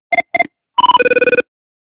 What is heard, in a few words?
intercom